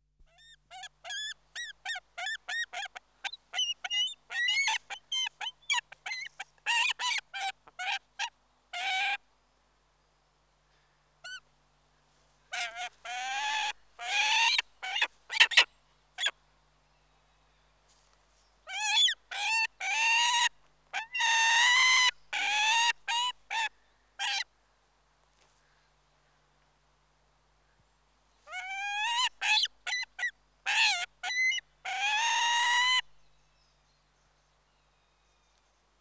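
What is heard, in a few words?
Peregrine Falcon (Falco Peregrinus); Birds; BBC Sound Effects Library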